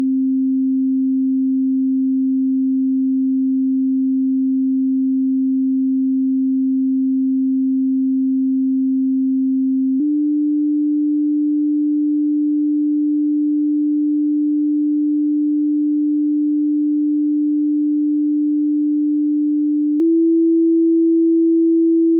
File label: surround test